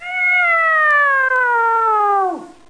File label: Dead Terydactl; Speed Bump Demo